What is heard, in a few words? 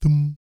BASS THUM; Spectrasonics; Vocal Planet; Jazz FX